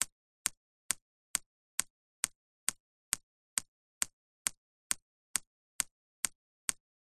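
water drips constant steady medium on laminated sheet; nature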